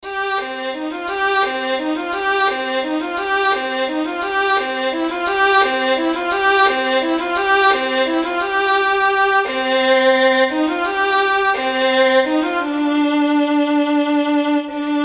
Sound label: Main Theme Violin; Game Of Thrones